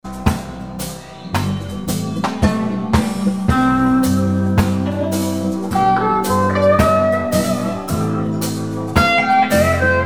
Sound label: SOLO, guitar